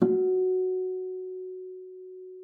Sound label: FLAG F4, harp; Xsample VOL-8; HARP+FX